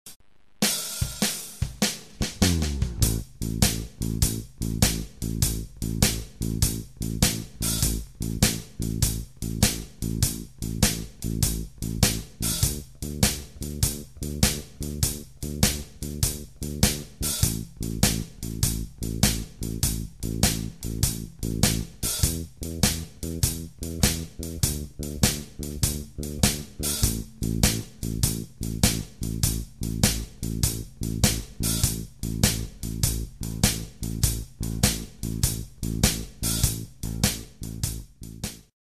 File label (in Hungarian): blues kiseret egyszeruen alap, gitár